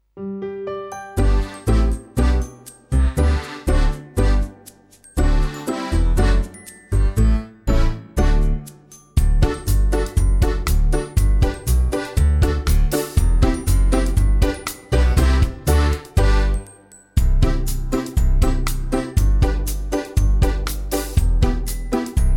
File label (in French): mere michel karaoke